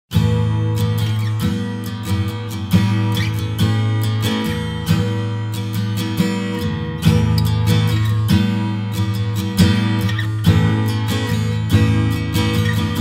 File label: Open Chords Major lydian